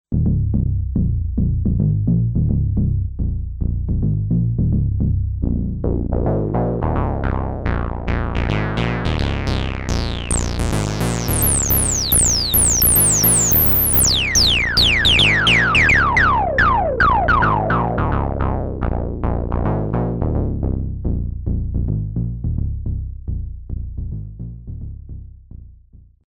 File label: Korg Monologue Deep Bass Filter